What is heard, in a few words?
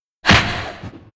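butterfly damage; monster; Senillinea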